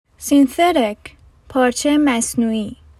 Synthetic

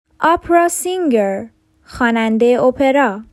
Opera singer

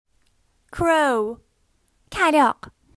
Crow

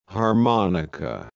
harmonica

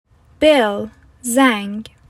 Bell

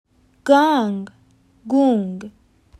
gong

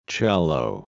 cello

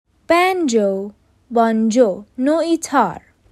Banjo

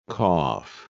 cough

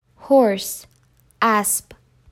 Horse

